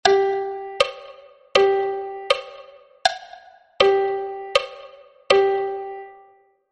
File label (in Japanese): 4分休符